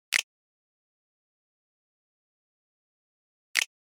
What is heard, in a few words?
Snap Dry